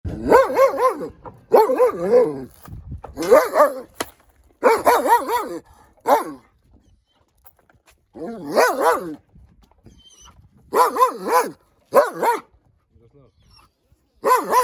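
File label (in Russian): cobaka laet, dog barking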